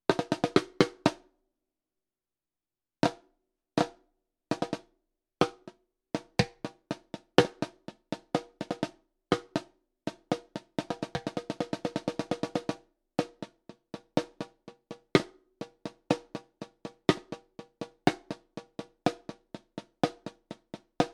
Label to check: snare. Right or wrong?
Right